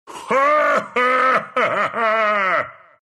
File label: laugh, ru; Ursa; Dota 2